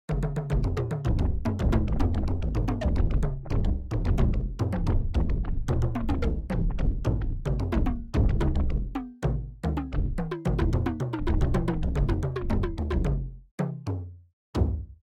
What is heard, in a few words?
indian percussion pakhawaja